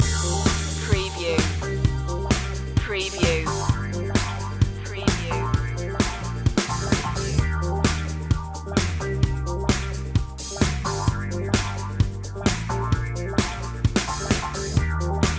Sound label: boogie disco loop